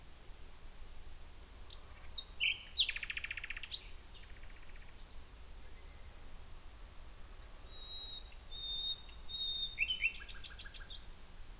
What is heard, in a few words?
Nightingale; Knepp